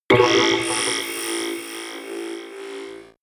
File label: mechanic alien